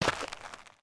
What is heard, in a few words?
Foot Dirt Sneaker Walk, footsteps